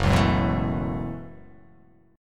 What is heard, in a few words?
Gb Minor 11th Gb down; Gbm11; strummed; JGuitar